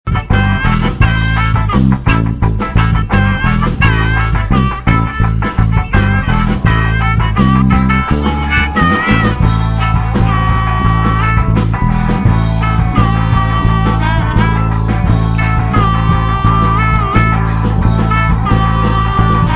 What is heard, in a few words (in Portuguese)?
funk surf